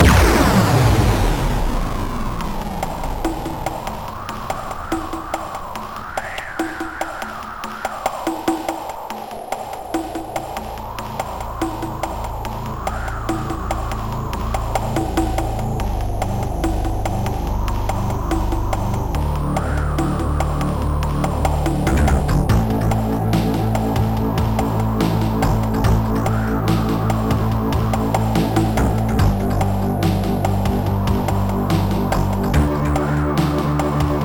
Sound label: gameover tune; MED